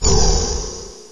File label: respawn; XDM